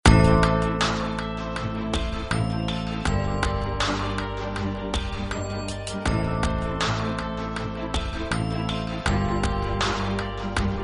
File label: snow